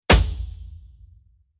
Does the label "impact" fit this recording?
Yes